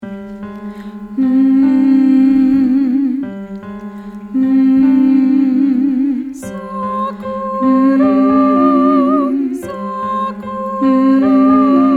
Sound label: Sakura Sakura Tenor